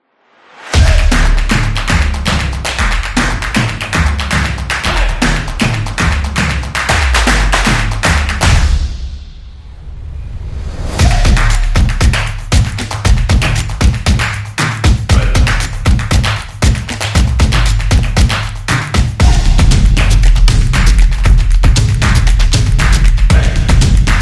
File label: The Clap Motion Graphics, soccer drums